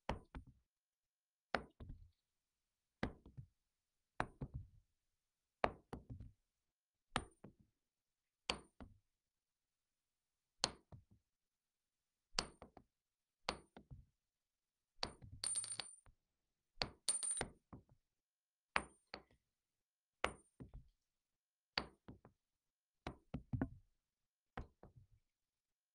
cartridges and casings shell drop on carpeted floor internal; fvtt sonniss gdc audio